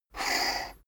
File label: poker chip slide table short